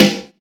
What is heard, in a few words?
sharp snare sound g sharp key, Royality free snare sample tuned to the G# note. Loudest frequency: 1668H